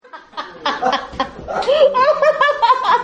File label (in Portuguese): risada